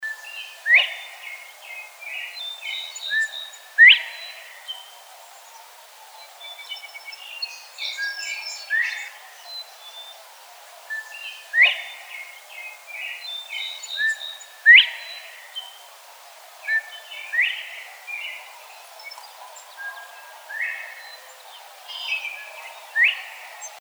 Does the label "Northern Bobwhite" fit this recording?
Yes